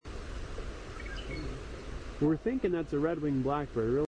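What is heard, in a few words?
Red-winged Blackbird song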